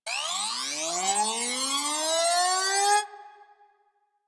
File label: Tesla Coil Charge Up; Ambience; Warframe; Corpus Gas City; Gameplay Remaster